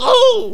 pain; player

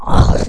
gasp; player

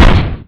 obelisk hit

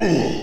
pain

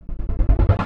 teleout; missionpack

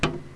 footsteps clank

